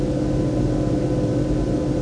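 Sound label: background music; Virtual Graceland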